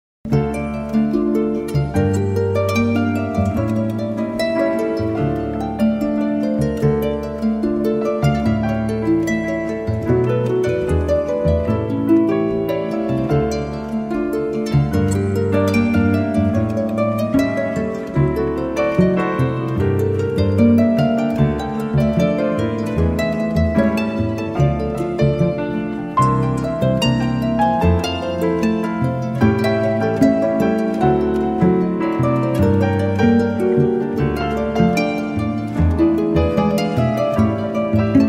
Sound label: Brazilian Jazz